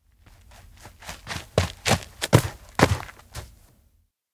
steps outdoor beach run up; minato; anemoi

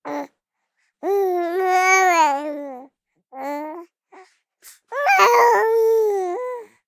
baby voice; anemoi

